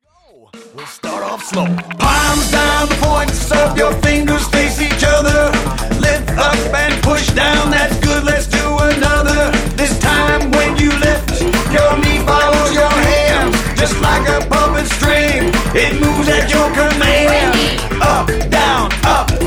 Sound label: hip hop fast